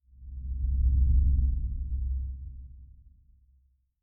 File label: Sub Riser